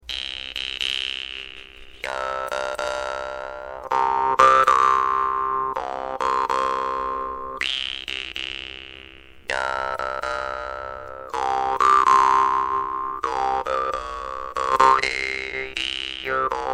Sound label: doromb; zulu